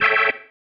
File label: Stab, Organ, One Shots